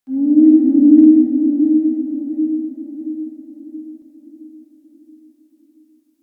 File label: Forest Distant Misc Animal; Warframe; Grineer Forest